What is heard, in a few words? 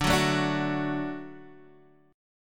D Major D down Guitar Half Step Down